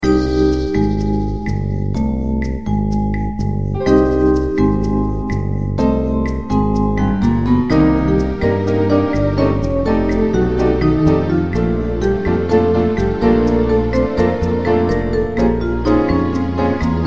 Elevator Music

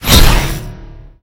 Pickups Powerups

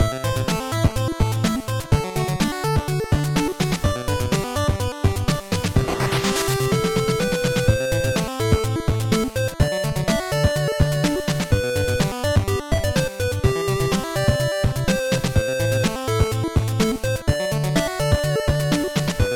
Dungeon; Alwa's Awakening

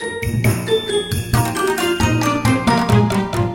Battle Music Intro; Naruto Ultimate Storm; android; StudioDOMA Kihan Anniversary Event Throw cakes

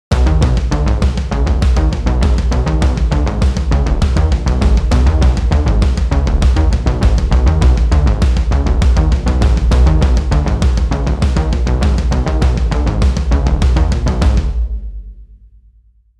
LOOP Victory Music 100 bpms; Just Pong

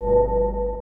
chime

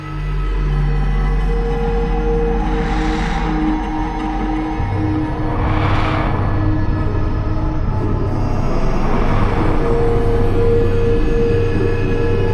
School Ambient

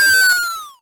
Fall down; puchicon; dsi ds

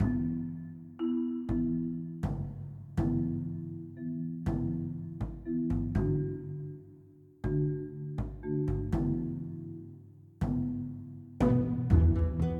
Forest Loop; Steamworld Quest Hand of Gilgamech; nintendo switch

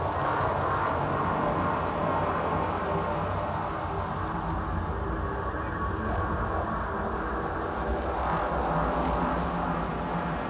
throbbling anomaly loop

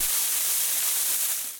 climbing vines; Scrat

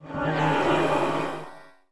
Cast

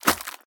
Scenario Gate Gore Hit

